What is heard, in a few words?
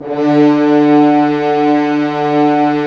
Horns; Roland - LA Composer vol. 4; HZ Horns